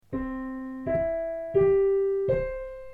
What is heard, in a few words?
arpge